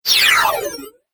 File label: Game App Magic Attack, Video Game Sound Effects; Twistedtracks